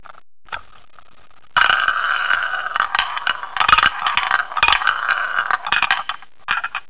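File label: roulette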